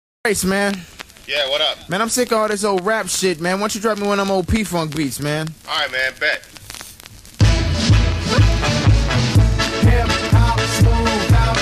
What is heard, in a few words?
P-Funk